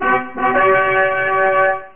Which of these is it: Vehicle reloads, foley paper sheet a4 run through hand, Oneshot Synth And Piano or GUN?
GUN